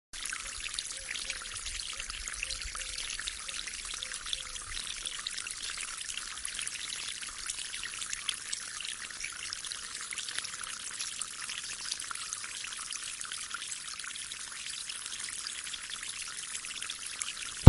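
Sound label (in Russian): nebolshoi ruchei zhurchit v lesu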